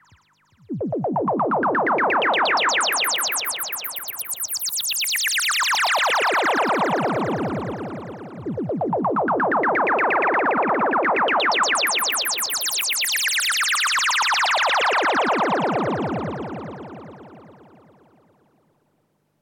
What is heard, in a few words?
space sweep